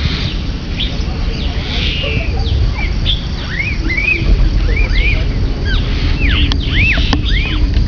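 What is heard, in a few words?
Crested myna; birdcall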